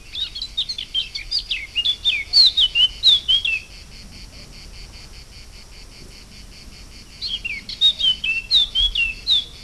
call, Blackcap, two phrases of a long song bout. Both phrases (a long and a short one) end with loud fluting notes.; sylvia atricapilla